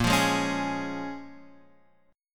A sharp Minor 7th, A sharp, down, Guitar, Half Step Down